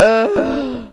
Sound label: moan; ambient